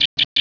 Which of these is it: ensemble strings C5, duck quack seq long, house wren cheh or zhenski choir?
house wren cheh